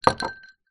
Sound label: food drink glass ice liquid down table